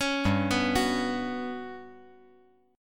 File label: G Major 7th Flat 5th